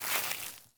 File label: grass step, footsteps